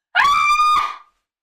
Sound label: scream